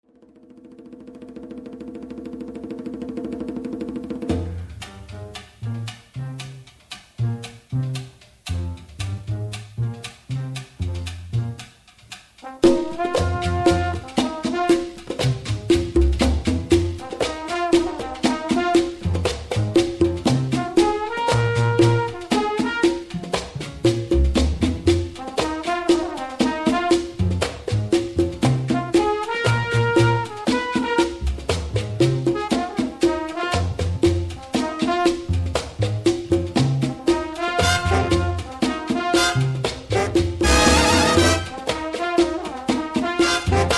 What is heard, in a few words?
Latin Jazz; Putumayo World Music